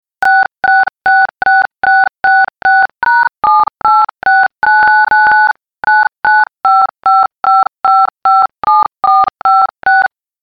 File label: Jingle Bells, touchtones